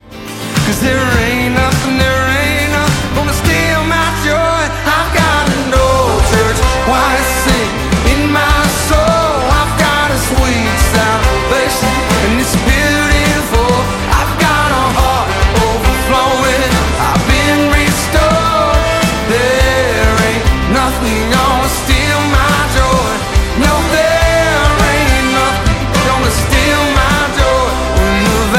old church choir live

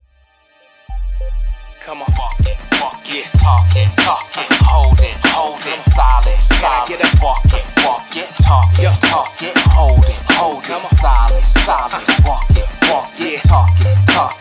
walking bassline

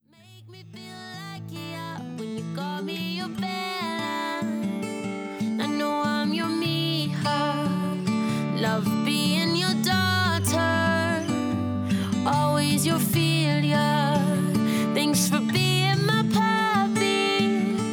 acoustic

